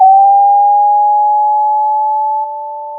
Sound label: pop, Sound of a school bell